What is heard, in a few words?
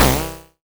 restart; storage-chaos